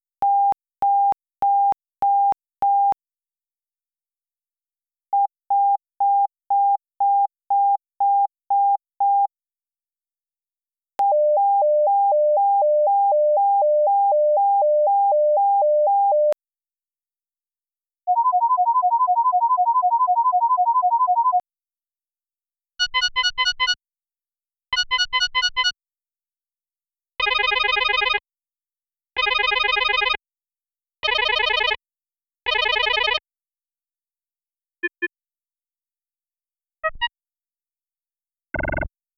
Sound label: alarms